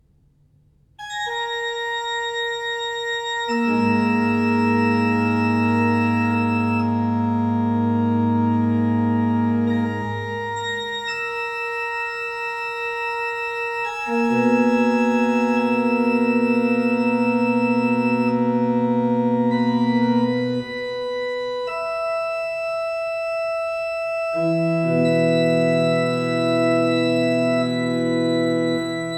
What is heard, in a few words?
pedal tones